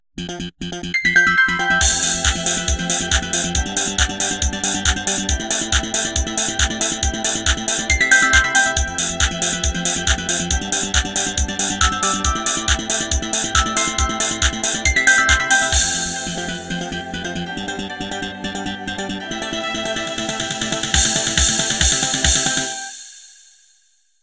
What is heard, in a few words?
Nokia Ringtones Nocturnal Remix